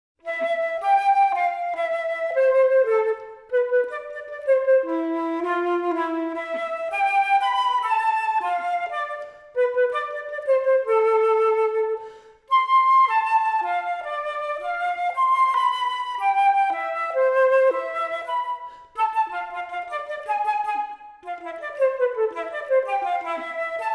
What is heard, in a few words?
Rumba; Study No. 44